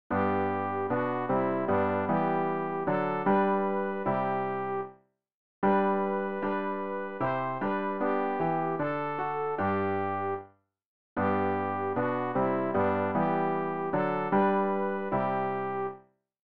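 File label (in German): sopran, Übehilfen; RG 020: Ich erhebe mein Gemüte; Ref. Gesangbuch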